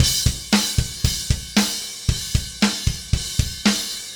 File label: Beat Crash, Indie Rock; Haze; Sonic Foundry (Sony Creative Software); Indie Rock Anthems; Session 06 - Haze